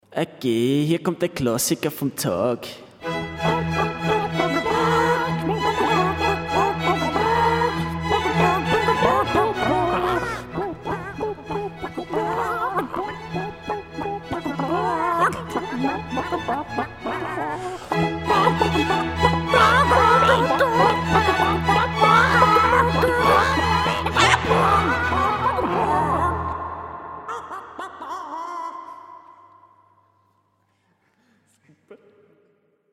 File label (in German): Jingle; Rovermagazin Klassiker